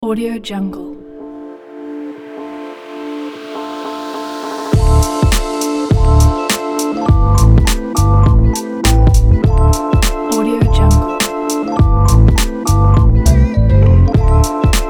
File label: hip hop; singlefx